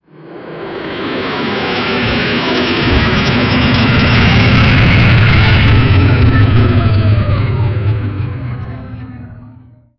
rocket engine loading up

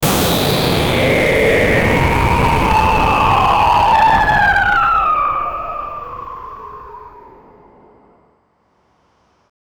death, agony

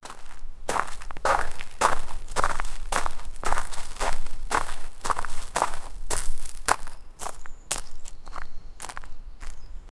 sound effect of footsteps, gravel

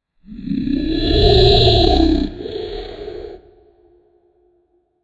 a gentle dragons roar; beverage

